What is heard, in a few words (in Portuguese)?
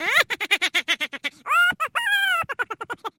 risadinha cartoon